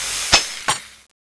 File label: junk gun draw